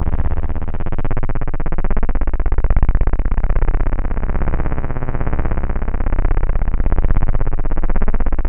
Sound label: C1, SynthLine; 90 s Sample CDs; NiceBeats; SynthLine VOL-24